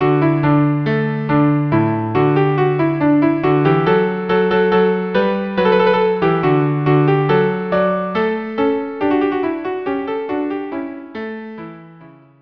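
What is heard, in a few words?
rondeau violin cello viola cello duo; mouret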